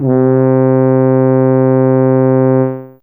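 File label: Trumpet Mid C3, Fairlight CMI IIe; Brass1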